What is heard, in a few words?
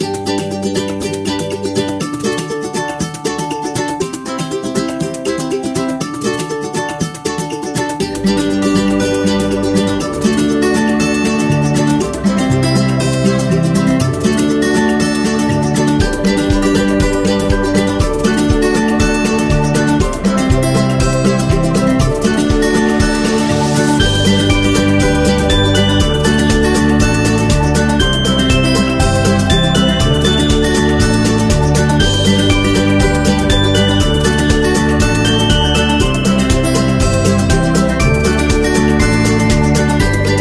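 Fun Quirky, Upbeat and happy background music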